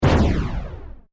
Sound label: Science Fiction Laser Cannon Single Shot Gun; Lasers